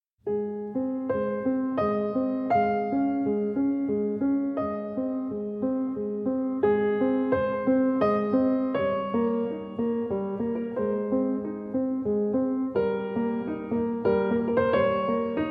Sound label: Piano Sonata in E-Flat Major, Fantasia; Movement 2.